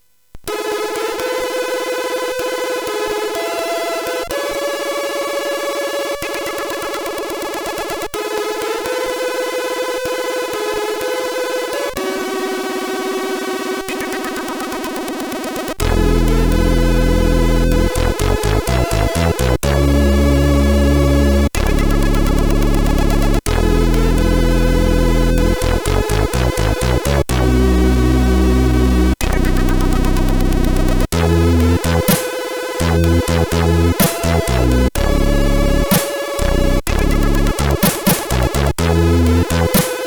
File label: Game Music, CSG8580R5; sid